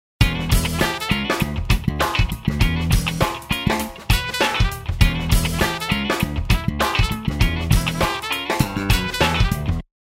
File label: brass mix